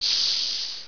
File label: hiss